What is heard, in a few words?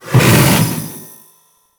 Vortex Enemy Death; Trapper